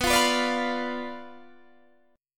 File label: B Diminished B down Guitar Open D